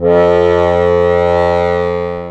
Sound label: DIATON BAS, AKKORDEON, Alpine Volksmusik; Best Service